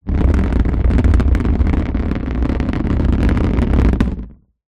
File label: plastic tub box large drag slide pebbled concrete ground; foley